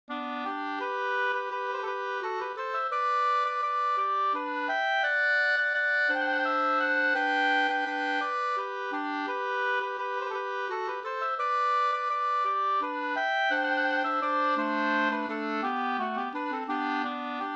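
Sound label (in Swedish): vals, two-part; Aspovalsen Sd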